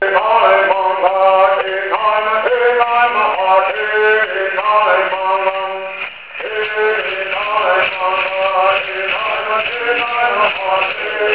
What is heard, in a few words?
Bird